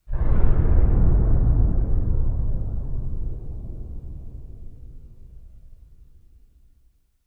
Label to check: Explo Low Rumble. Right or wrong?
Right